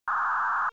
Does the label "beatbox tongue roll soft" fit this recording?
No